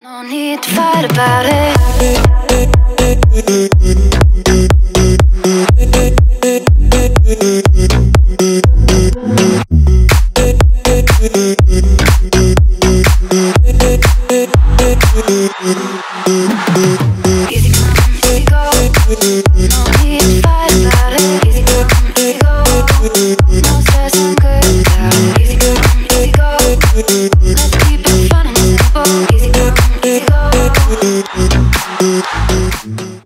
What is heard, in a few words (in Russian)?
zapadnye klubnye narezki